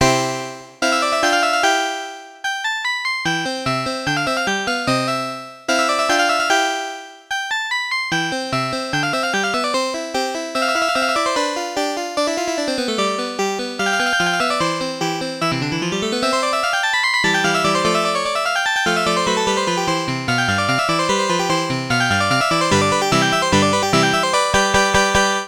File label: harpsichord